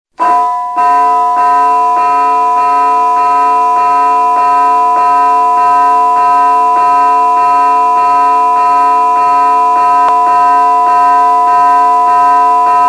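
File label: general quarters alarm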